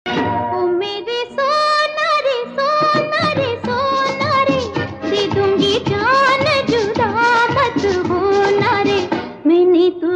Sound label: hindi ringtone romantic ringtone